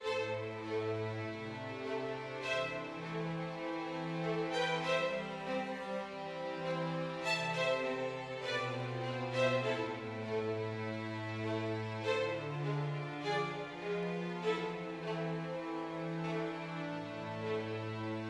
adventure med

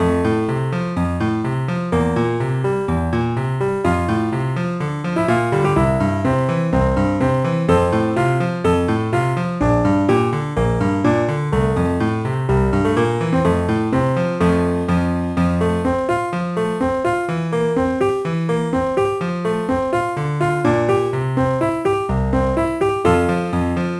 BGM PIANO

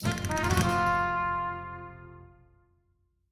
End 108bpm; Music Act Hispania

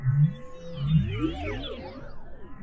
Killing

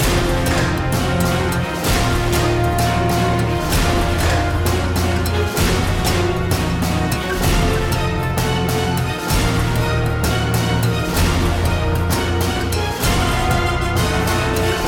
Battle Loop; Infinity Blade 3

